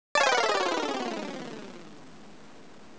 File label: sequence loss